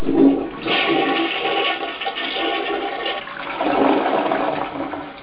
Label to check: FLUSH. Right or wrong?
Right